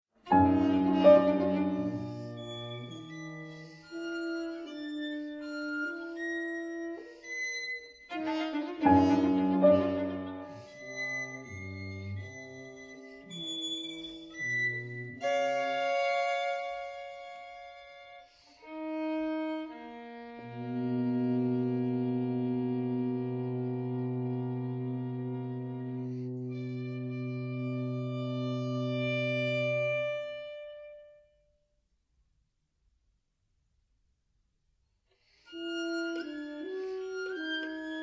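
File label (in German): Trio für Horn Violine Klavier; Trio fuer Horn Violine Klavier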